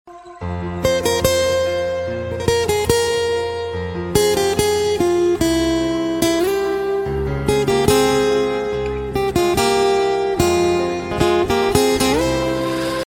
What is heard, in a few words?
sad guitar ringtone